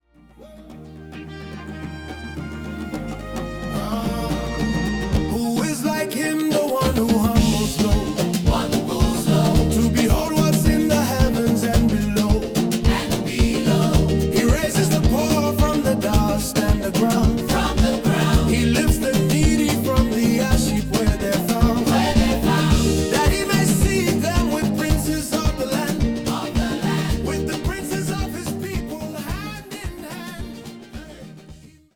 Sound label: Afrobeats